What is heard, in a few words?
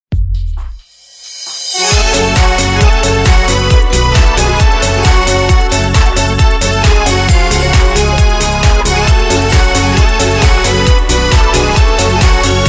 Korg Pa3X